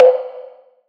Wood hit reverb; SFX; One shot; 7rystan's 600+ FREE EDM SAMPLES & DRUMS

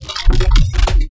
bass spectral; base pack

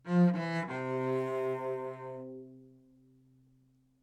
live string phrases, string phrase Cmaj 100bpm

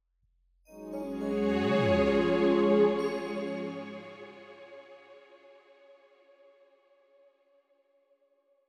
botanical swell Db 108bpm; bespoke - Floral v.1

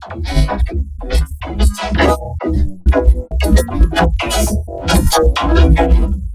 synth loop spectra resample cut E; base pack

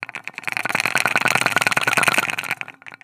Bobblehead shake, Foley; SFX; 7rystan's 600+ FREE EDM SAMPLES & DRUMS

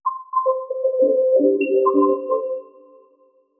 glitch C maj; fx; bespoke - Floral v.1

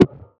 Synth

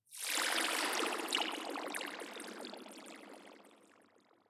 bubbly sweep; fx; base pack

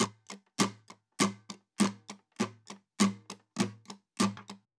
guitar percussion 100 bpm; percussion loops; bespoke - Floral v.1

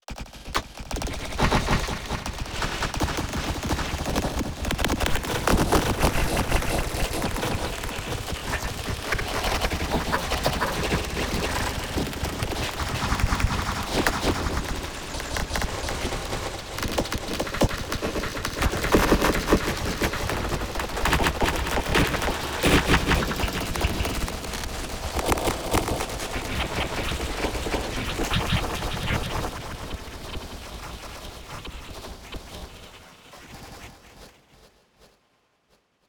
cyber rain, sound design; base pack

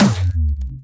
Impact

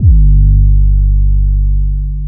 Trap bass, EDM; 7rystan's 600+ FREE EDM SAMPLES & DRUMS

